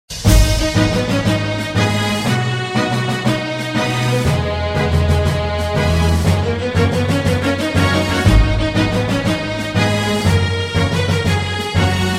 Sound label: Frogs Theme Orchest